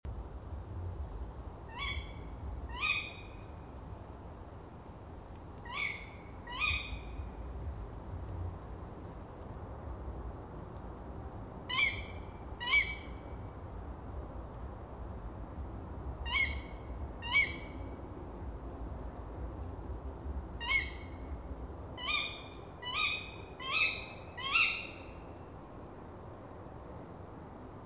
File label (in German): waldkauz kuwitt; vögel; rufe vögel